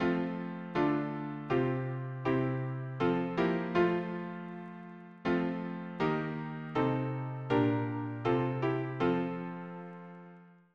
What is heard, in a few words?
harmony piano